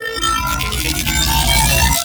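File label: Drone Projectile Charge; Warframe; Corpus; Corpus Lich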